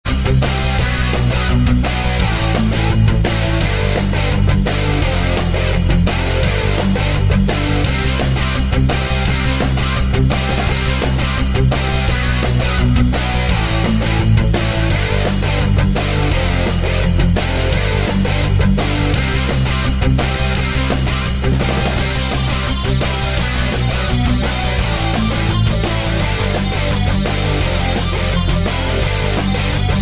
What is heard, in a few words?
Gritty Punk; bg-music